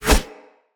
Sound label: Curved Sword Whoosh Right Left; Weapons; Player; Swords; Sinecure